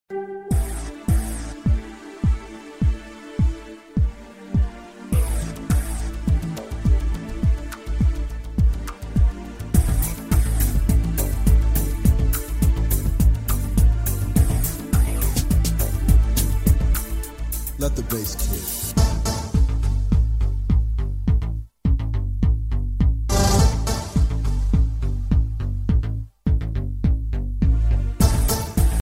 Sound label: arabic remix